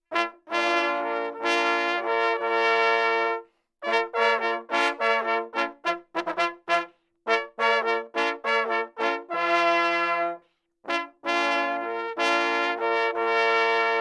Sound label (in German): Damhirsch Tot, Totsignale - Hochwild